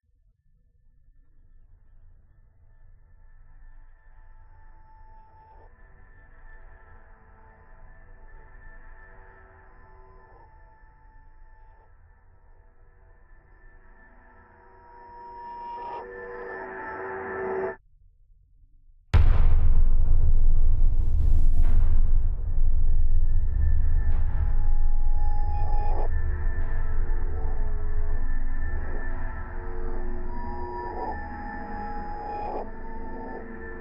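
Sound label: Audio Drone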